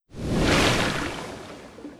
Deep Water

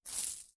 Coins

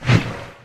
Dash; Superbrawl Universal Dash Sfx; Nickelodeon Super Brawl Universe; Android

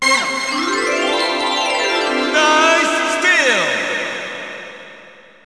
Str G#

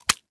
Animals Monster Small Insect Jaws Snap

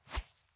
woosh; Ion Shift

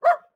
Dog Shiba

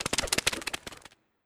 Skell Dog Run

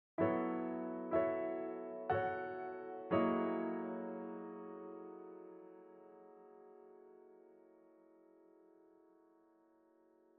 Piano; unsighted; Factory Boss Piano11

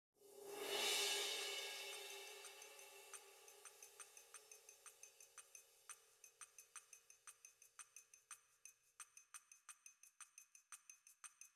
Percs

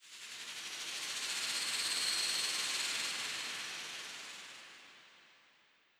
Xp Gain

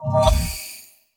dark Strike; darkStrike ii

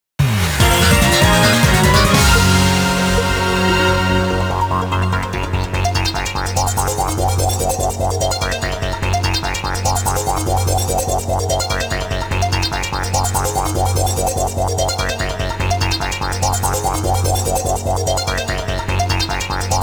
Win Jingle; Busou Shinki Battle Masters; PSP

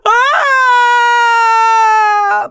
Enemy Generic Human Death Falling

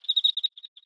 Env Void Insect Sing; viewfinder

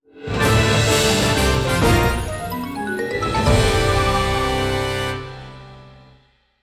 BGM Battle Win

